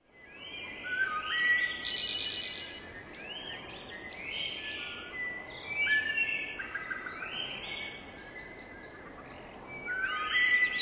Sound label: Ambience